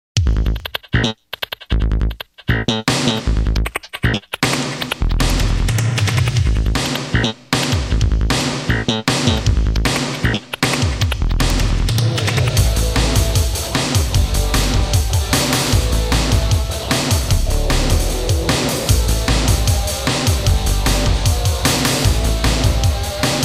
Raceway Rock

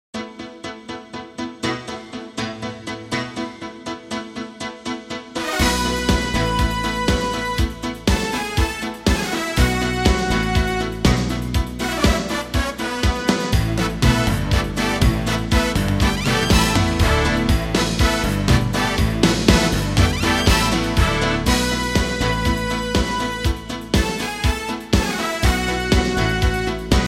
corporate

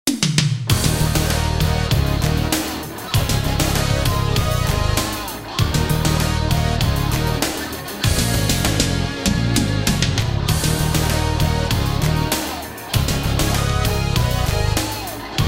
Rock

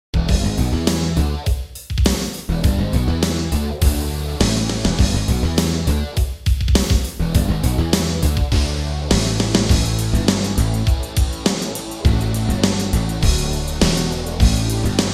Metal

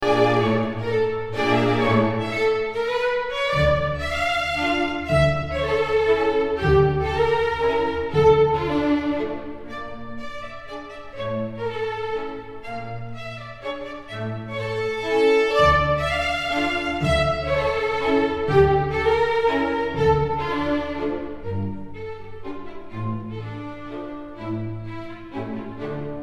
Mazurka

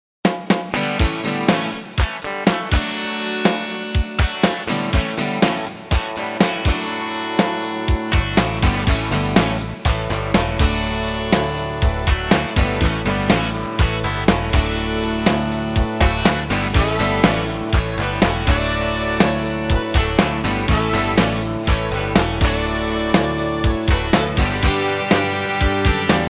Swamp Rock

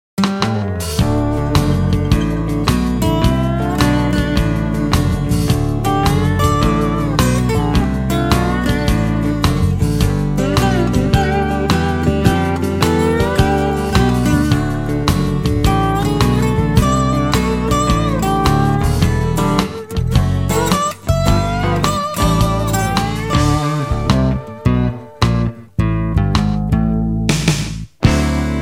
Winding Road Blues

